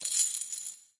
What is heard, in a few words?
rollover; Be Cool Scooby-Doo! - It's Dark Out There; ThrillGames